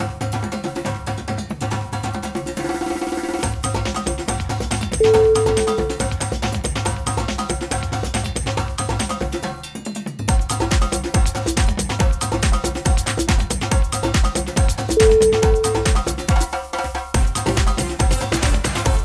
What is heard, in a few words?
football drums